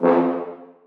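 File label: horns staccato e2; Sonatina Symphonic Orchestra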